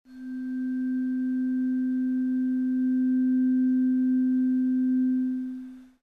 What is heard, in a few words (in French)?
diapason 256hz